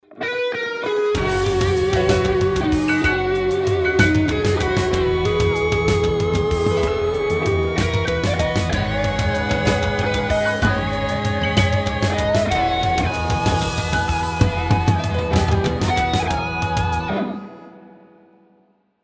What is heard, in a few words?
Logo